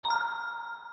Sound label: record